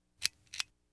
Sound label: Household Phones